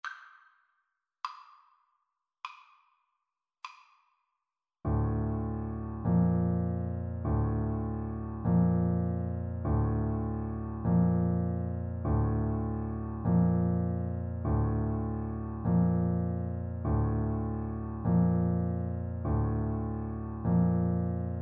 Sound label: chopin funeral march, bassoon